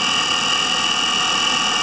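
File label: hard disk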